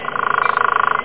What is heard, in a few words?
Woodpecker; Automne